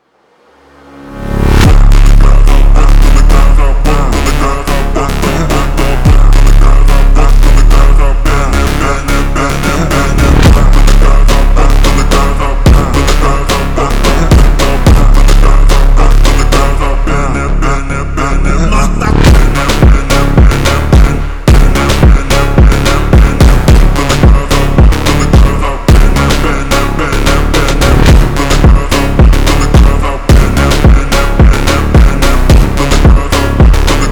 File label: slowed Reverb, funk